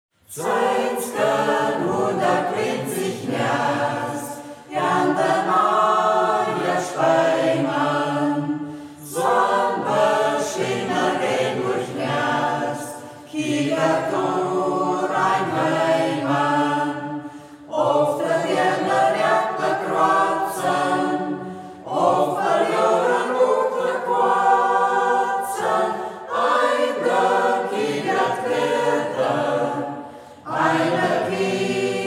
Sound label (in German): singkreis; Singkreis Kampestweinkel; Ortsmundart: Braller; Zeïsken huët seʼ klinzij Näst än den Äj; hegt wird gesangen